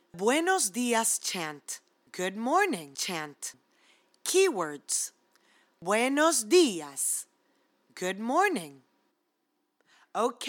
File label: Good Morning Chant Spanish; Rituals